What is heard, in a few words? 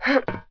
ladder; PC Gamer IT CD 41; Daikatana; mikiko